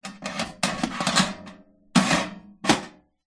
household air fryer oven metal grill tray place inside slide in